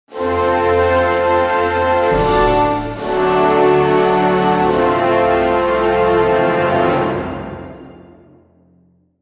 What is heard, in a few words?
Win Battle; Stronhold; heroes of might and magic online 2010 pc